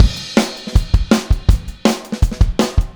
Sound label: Take Your Toms Off - Chorus - Full Mix, Drums, 162 BPM